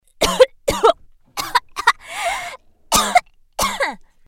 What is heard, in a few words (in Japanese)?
咳き込み; ASMR